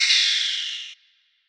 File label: Open Hats